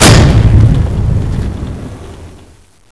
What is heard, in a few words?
explode